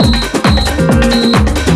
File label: DRM 135, House, LOOPS 135; Best Service ProSamples vol.10 - House; AKAI